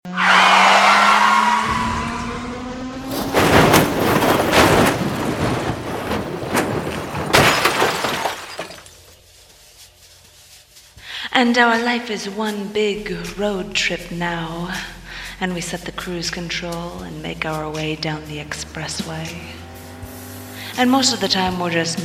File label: Fantastic Car Crash